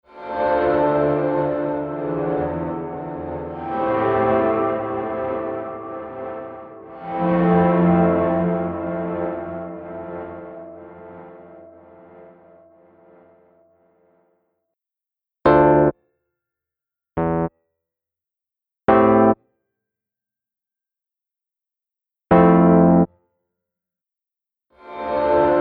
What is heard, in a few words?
Rhodes; H9 Bundle; Plug-ins: Blackhole (Nebula) + Undulator (Lonesome Trail)